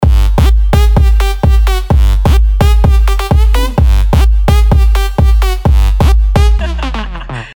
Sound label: Sylenth1 dirty dutch leads; Hardgaan; revealed sylenth1 dirty dutch leads vol 1